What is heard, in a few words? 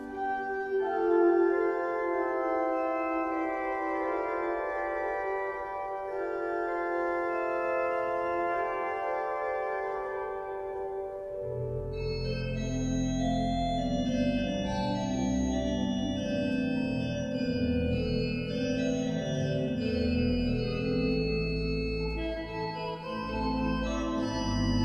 Breil, pipe organ